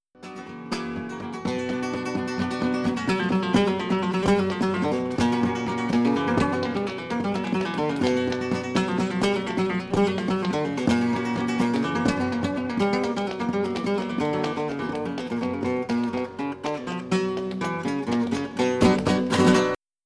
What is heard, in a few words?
Falseta